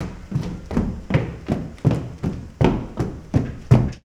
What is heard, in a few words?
FOOTSTEPS, Science Fiction; E-MU Producer Series Vol. 3 – Hollywood Sound Effects; Mower Man